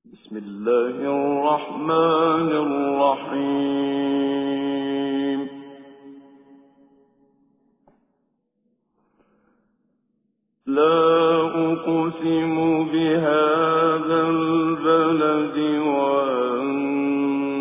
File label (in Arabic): سورة البلد, تجويد; المقريء الشيخ محمد صديق المنشاوي